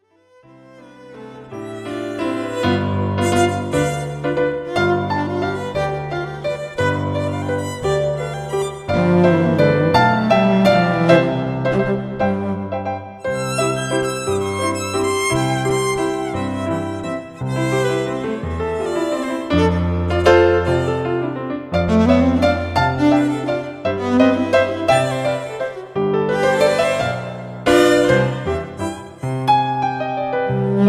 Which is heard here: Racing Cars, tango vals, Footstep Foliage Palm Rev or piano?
tango vals